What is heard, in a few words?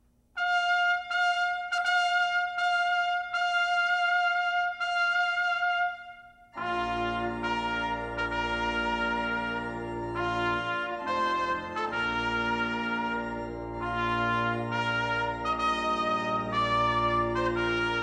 Organ; Wagner